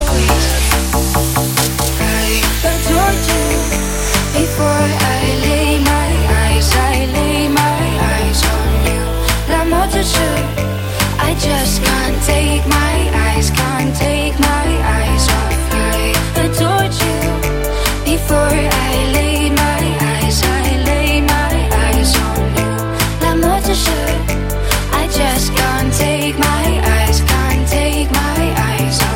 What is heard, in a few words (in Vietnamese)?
Nhac Chuong DJ VINAHOUSE STYLE